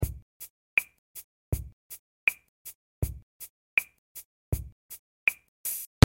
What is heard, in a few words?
TOY DRUM